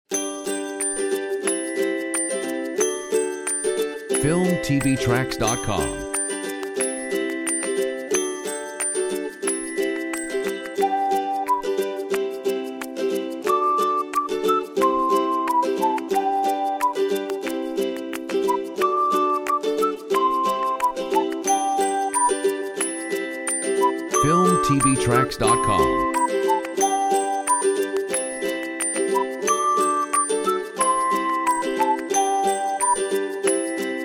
Joyful Ukulele

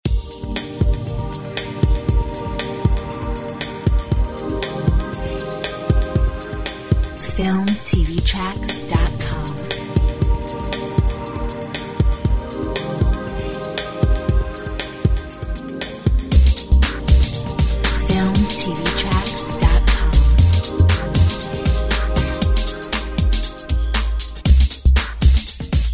Corporate

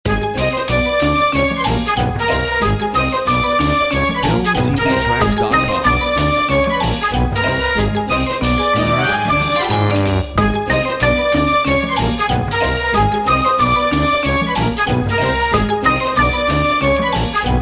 Cartoon kids; Filmtv-tracks

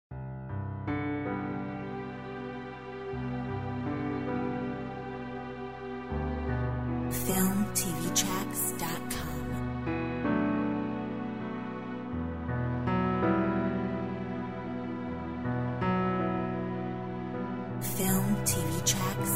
Orch

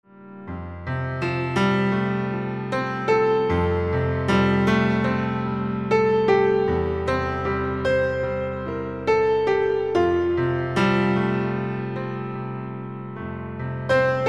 Ambiance Piano

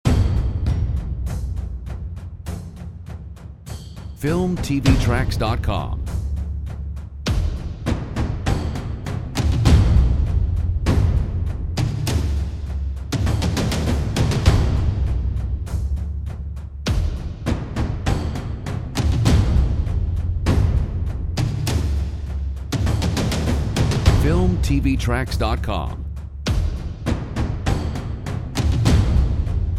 Epic Trailer Percussion

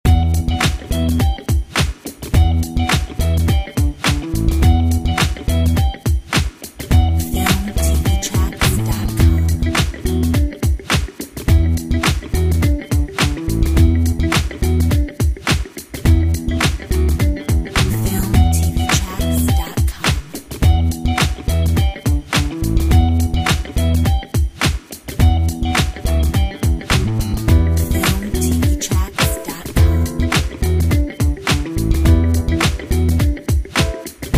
Funk machine

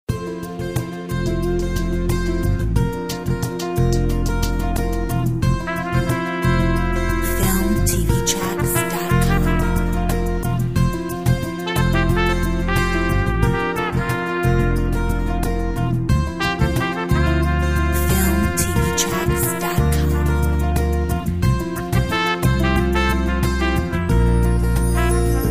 with trumpet

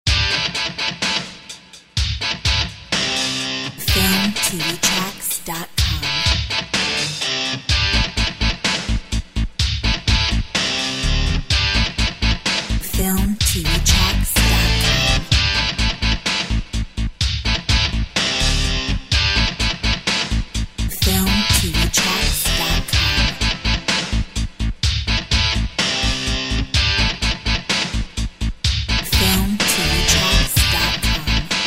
Texas rock

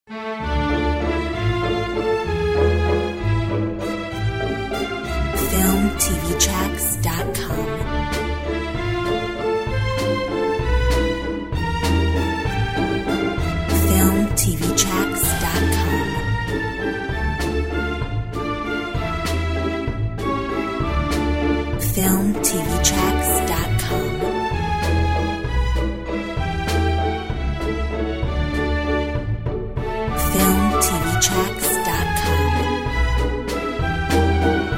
Waltz

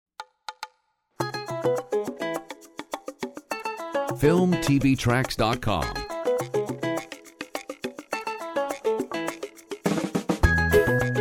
Ukulele; Filmtv-tracks

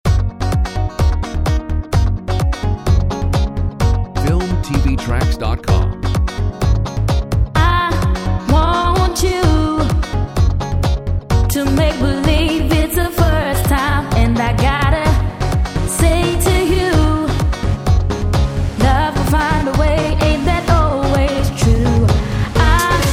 Summer fun vocal mix

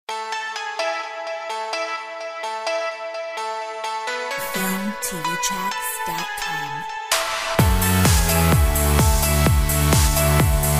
Euro Dance Club

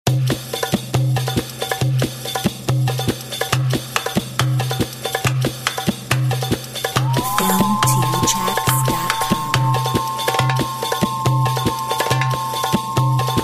Berber groove

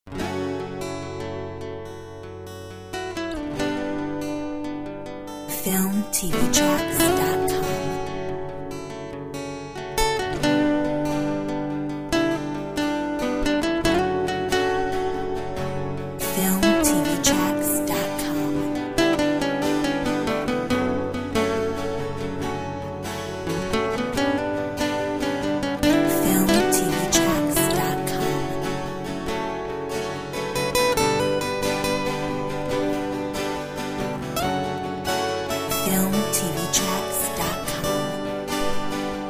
Sweet summer acoustic mix

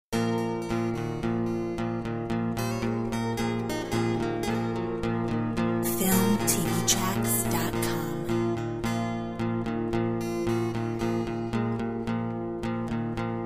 Mediterranean gtr

